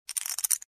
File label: Poker Chips Shuffling